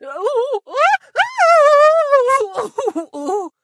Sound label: scream